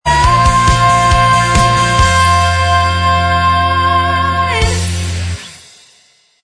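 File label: tv theme instrumental track; Nickelodeon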